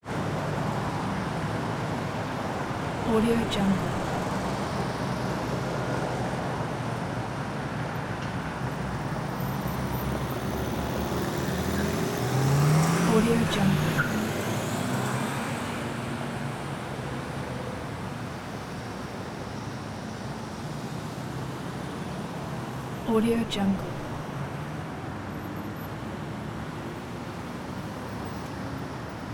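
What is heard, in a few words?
city ambience busy urban traffic close up buses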